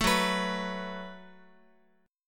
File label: Gb Minor Double Flat 5th, Guitar; Gbmbb5 strummed